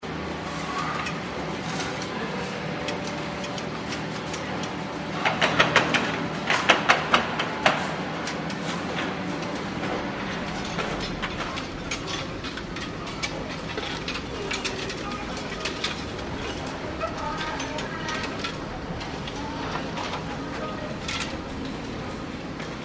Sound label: medieval bakery